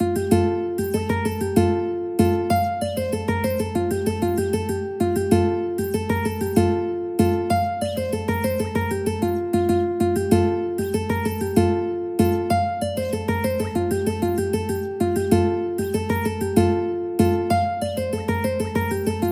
ukulele

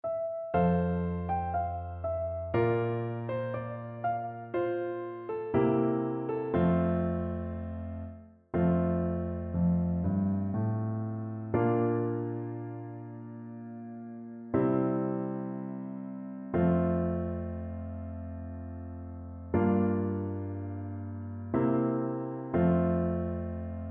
horn, French Horn

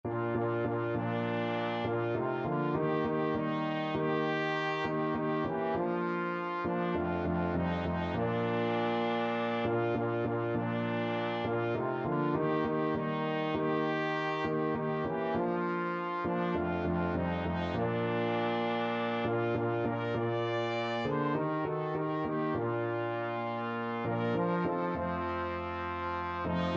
how great thou art, trumpet trombone duet; with piano

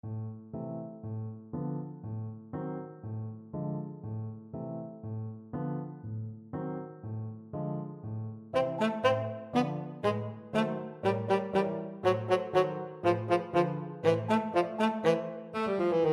tchaikovksy sugar plum, tenor saxophone

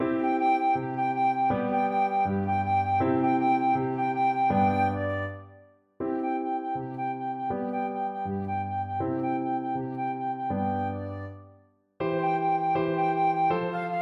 Mandolin, flute

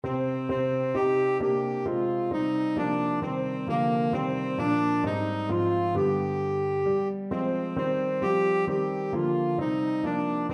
tenor saxophone

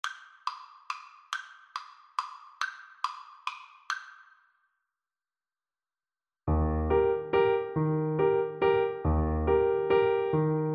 blue danube FL kar, Flute

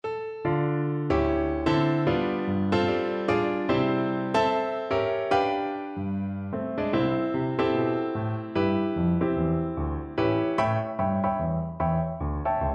aliceblue FL kar, Flute

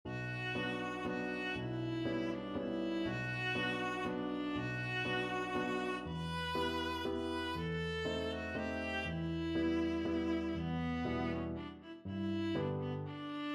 waltz, viola

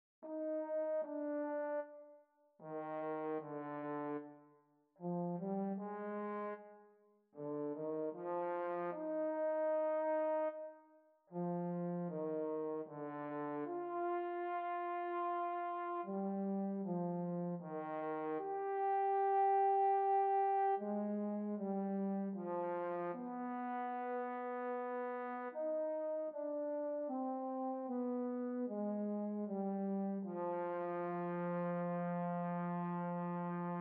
trombone quartet

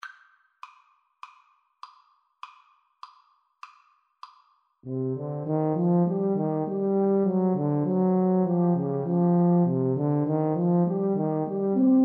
mountain king, 2 Euphoniums Tuba

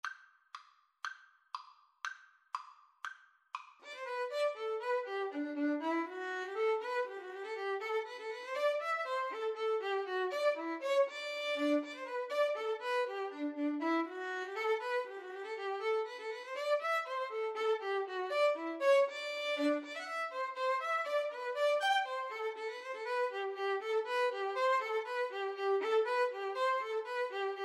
Quick March, Violin Guitar Duet

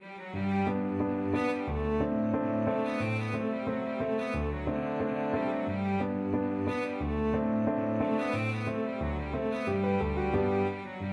hornpipe, cello

